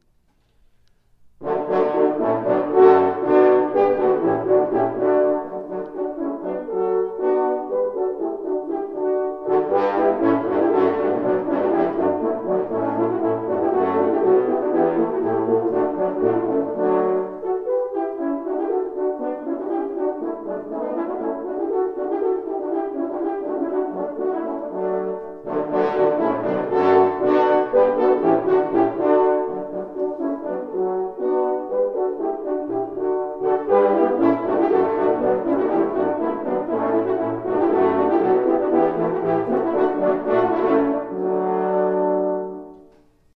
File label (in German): Bouree